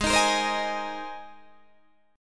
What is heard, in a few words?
Ab Major Add 9th Ab Guitar Open D